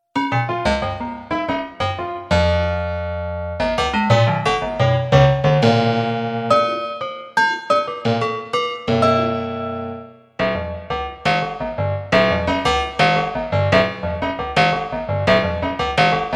Internal Osc X Synth Piano, ring modulator; analog synth

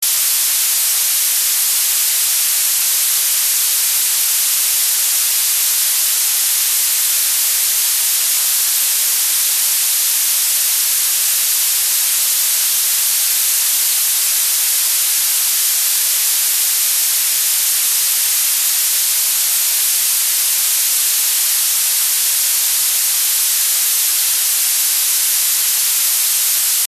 grainy noise hp high setting; NOISECORN

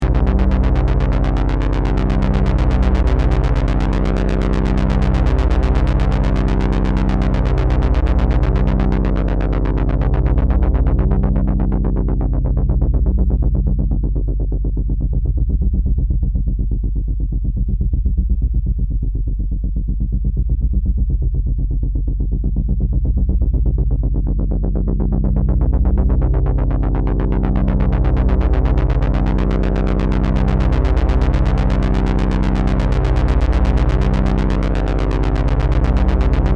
no res adsr lfo; lp vcf; analog synth

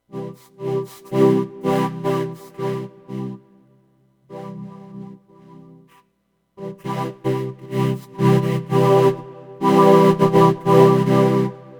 dynamic range, vocoder; analog synth

